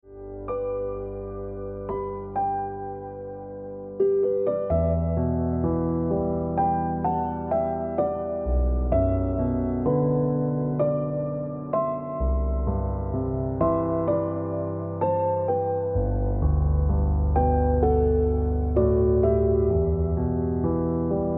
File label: Ringtone Piano Music